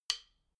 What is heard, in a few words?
drumstick hit soft, percussion